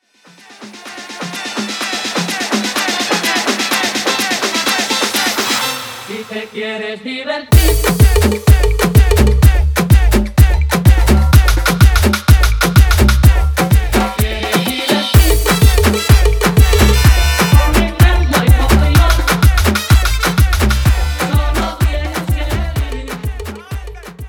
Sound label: afro remix 126 bpm